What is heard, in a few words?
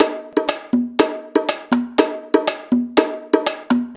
Conga Bonga, Percussion Loops